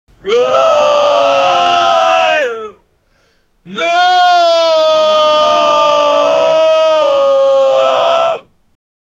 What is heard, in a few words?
men screaming; shout